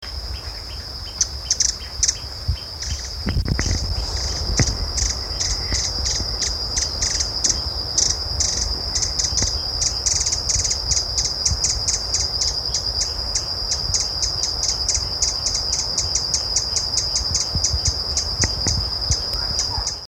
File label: rufous-tailed hummingbird